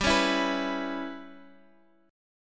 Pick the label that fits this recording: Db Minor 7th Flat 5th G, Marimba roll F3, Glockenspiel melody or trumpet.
Db Minor 7th Flat 5th G